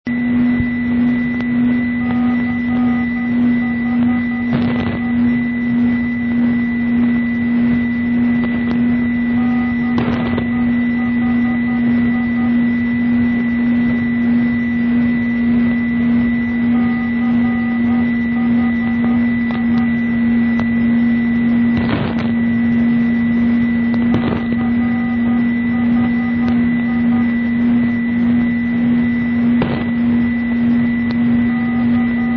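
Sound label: non-directional beacon; CHI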